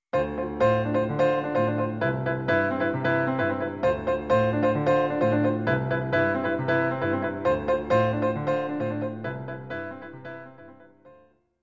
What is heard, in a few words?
Underwater BGM; NINTENDO